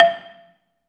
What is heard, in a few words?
BELA2 E4, BELAFON, Asian Traditions; USB Soundscan vol.60; AKAI